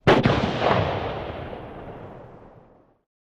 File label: Large Guns Fire Close